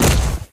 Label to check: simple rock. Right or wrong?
Wrong